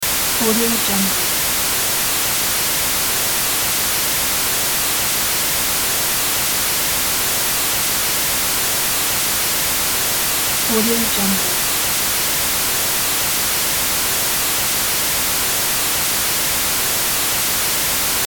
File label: white noise